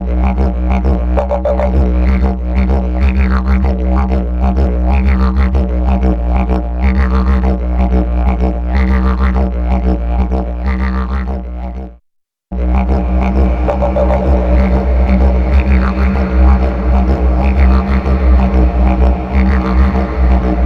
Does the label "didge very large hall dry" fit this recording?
Yes